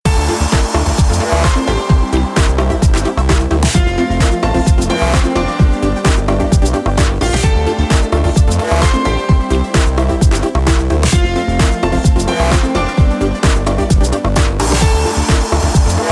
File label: dance mix, 432 Hz